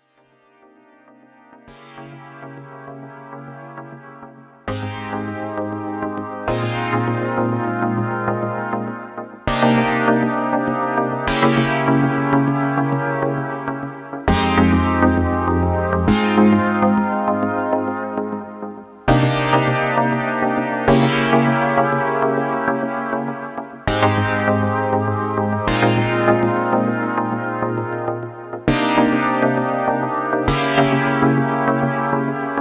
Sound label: (100 BPM)